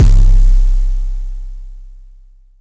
boom, Kicks; ModelCycles

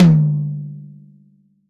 TOM, CTD TOM B

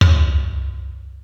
Tom, Yamaha SY22

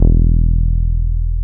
BAS MKS-50, Roland

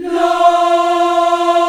AFRO LA F 4 L, CHOIR, Heart of Africa; Spectrasonics

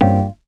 piano stab

